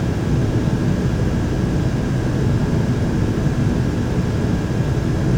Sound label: interior office; ambient; Counter Strike Model Pack; CS:GO; nuke